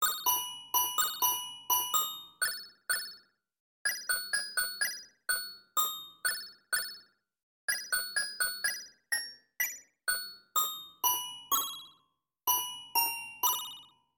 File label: dollhouse arpeggio